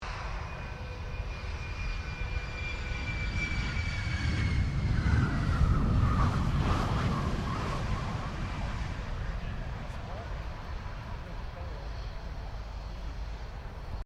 Airoplane Jetfighter F16 Fighting Falcon Landing, Airshow